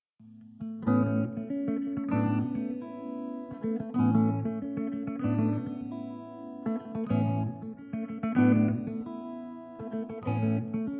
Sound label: A96 GTR motif in 5/4